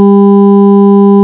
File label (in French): son sombre